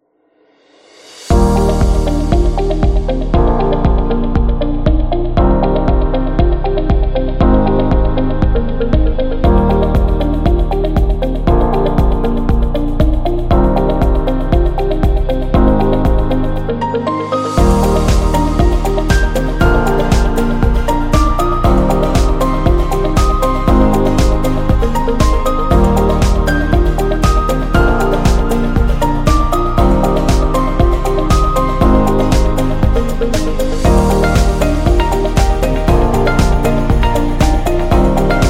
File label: Business Corporate; motivational speech